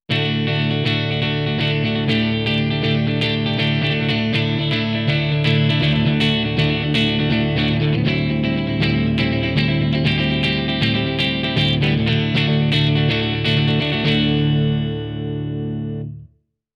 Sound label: bypass; Maxon VJR9